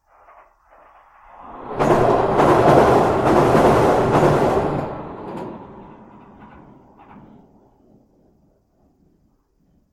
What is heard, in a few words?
under a railbridge